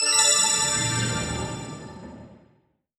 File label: decision jingle; soundeffect